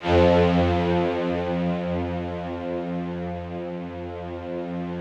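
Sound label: rough strings F1 right, Yamaha VP-1; Music Store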